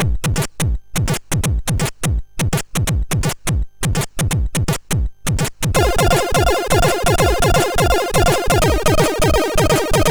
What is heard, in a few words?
SID Jig, MOS6581R3